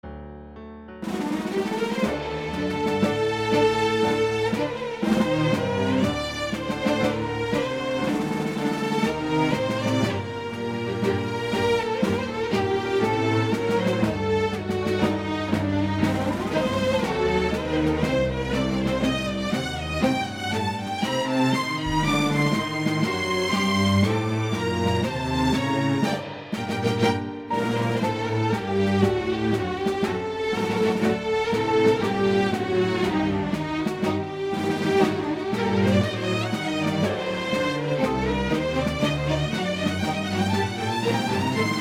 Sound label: strings